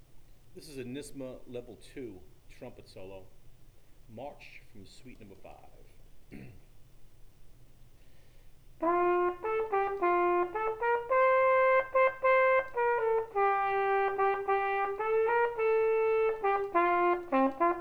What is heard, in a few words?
trumpet level 2 March; Trumpet NYSSMA Solos